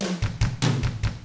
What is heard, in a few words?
rock 6/8, drums; ILIO; Double Platinum Drums 1